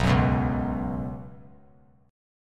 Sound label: B Suspended 2nd, chord; Bsus2 strummed; 3C,3F,3A,3D; 11,13,14,11; JGuitar